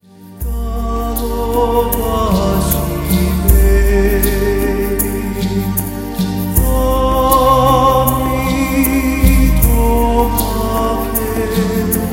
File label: Bengali Lullaby